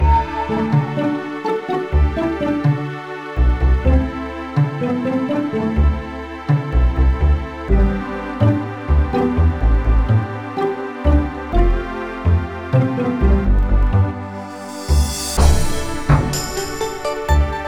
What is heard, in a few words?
Cave; Vicky the Viking Part 2; NDS